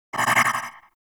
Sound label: Ball Warning Pulse; Warframe; Missions; Tenno Ball